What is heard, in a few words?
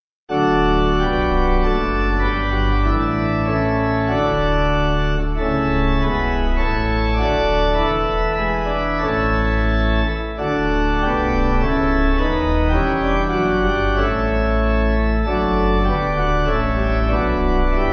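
D Pipe, pipe organ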